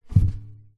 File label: musical guitar acoustic nylon set down carpet